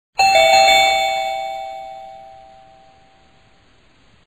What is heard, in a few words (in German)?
Hausklingel eilig; Haushalt